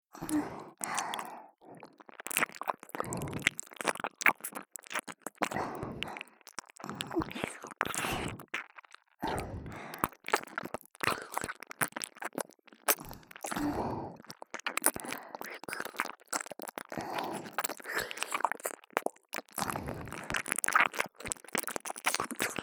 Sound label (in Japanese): 両耳舐め; L書記・R書記